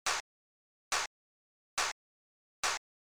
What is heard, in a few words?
snare